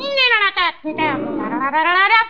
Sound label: mouth music